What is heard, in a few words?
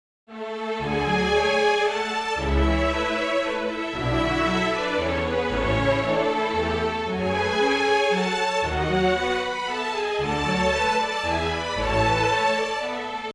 str ens